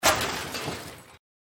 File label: crash, Vehicles